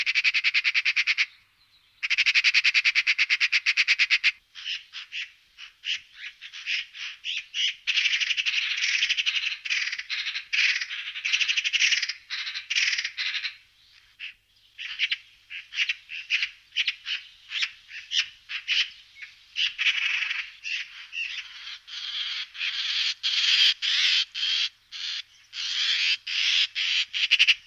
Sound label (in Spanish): Pica pica - Urraca, aves; los seres vivos